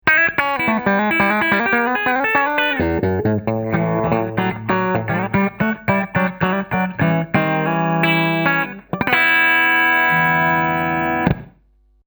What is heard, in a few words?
Bridge Single